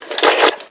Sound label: Can Pickup Generic